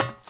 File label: ladder; starship trooper; humans; Nejlepší České Hry